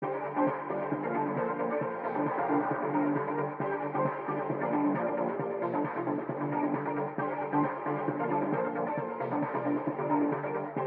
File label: radio music ambient; Mirror's Edge (2008)